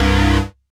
SYN TEKNO; Sound & Vision - Gigapack II

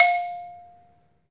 3D MARIMBA; 3D Sound; 3D Keys VOL-1

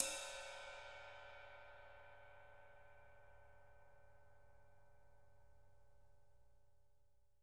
rides

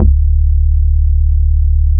BASS, Bass Analog & Synth, GARAGE; USB Soundscan vol.12; AKAI

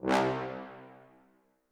trombone bass stac C2, Brass; BBC

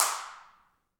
CLP HNDCL, Handclaps

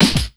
ROCK 132, Big Beat Drumloops

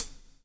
BLUES TK, Backbeat; Spectrasonics - Backbeat

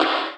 Slid, Hit; Sonic Foundry (Sony Creative Software) - Future Retro Dance Excursions

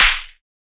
SAND, Drums; Distored Reality 1&2

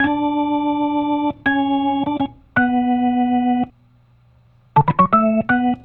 Soul Organ Riff, 70s Analog Funk; Sonic Foundry (Sony Creative Software); What it Is!

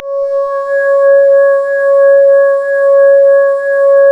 C#, Synclav FM; Big Fish Audio; SPACE1

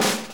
Snare B; Sonic Foundry (Sony Creative Software); Loops & Samples Drum Tools

